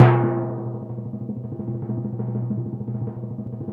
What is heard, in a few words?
KETTLE ROLL, ORCH PERC; Greytsounds VOL-1